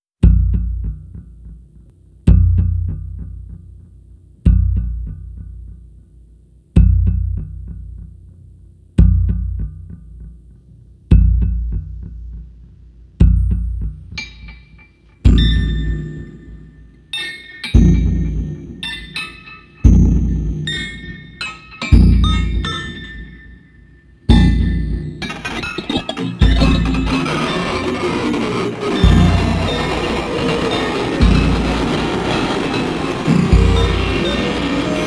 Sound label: Instrumental